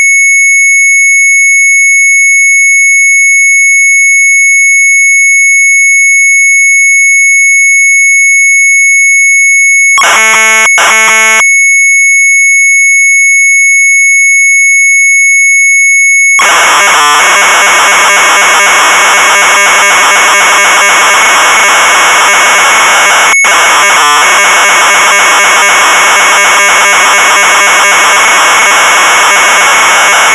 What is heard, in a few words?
Sharp MZ800 Tape